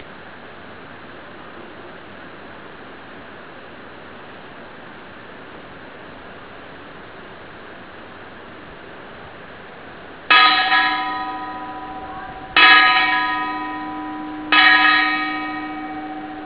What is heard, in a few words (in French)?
Sonnerie des heures avec un bruit de cloche felee